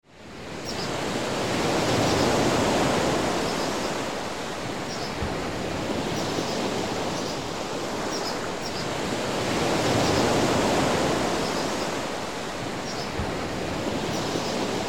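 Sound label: ambience hitchhike; Wario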